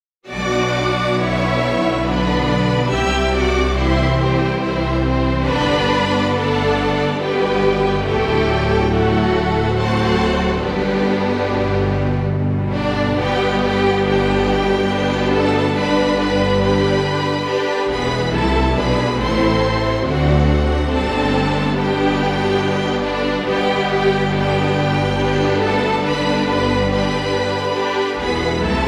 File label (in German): Guitarren, Instrumentale Version